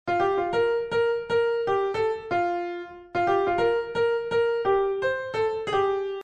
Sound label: melody; Vocal melody; sorta muppets jazz feel